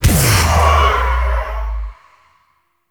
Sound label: Beam Loop Stop; Warframe; Wisp; Reverie